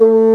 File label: BASSOON A3